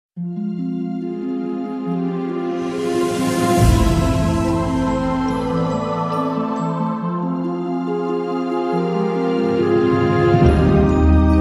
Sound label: magic; wow